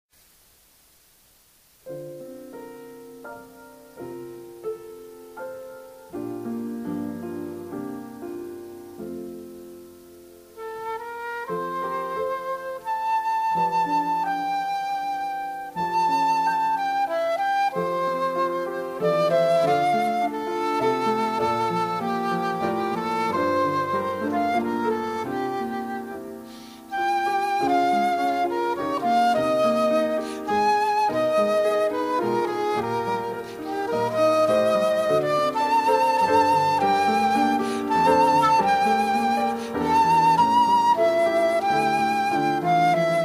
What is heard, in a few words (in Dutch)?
Reverie, Fluit en piano